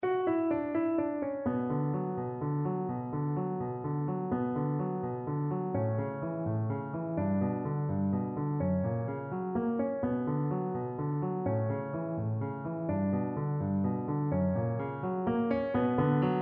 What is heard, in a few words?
spanish violin